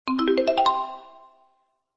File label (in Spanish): marimba arpegio